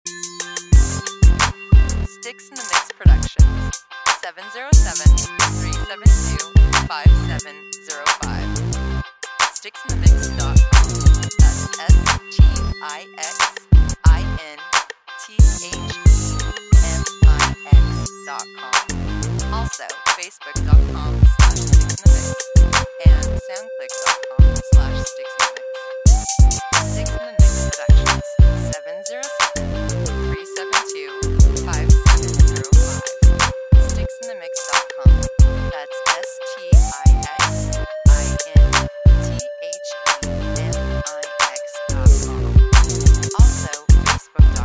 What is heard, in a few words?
Guitar; Guitar Mob